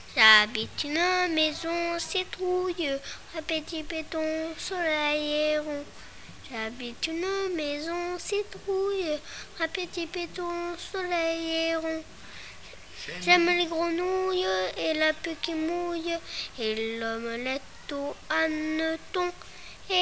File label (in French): citrouille, chants; maison citrouille